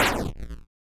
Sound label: sfx enemy hit; LD55